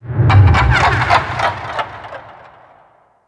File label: failsound; OSCT 2023 reworked